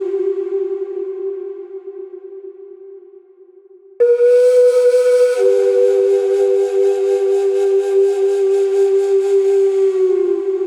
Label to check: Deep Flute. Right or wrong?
Right